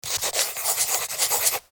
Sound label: pencil foley write